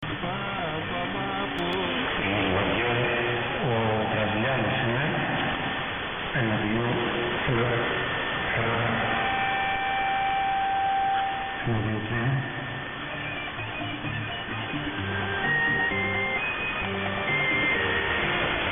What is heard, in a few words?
1670kHz; Brazilianos